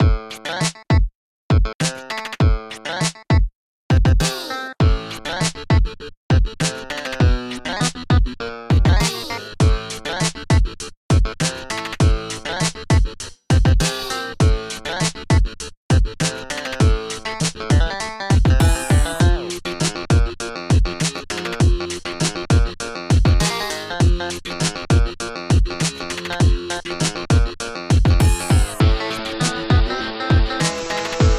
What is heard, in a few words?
Faucet (Instrumental)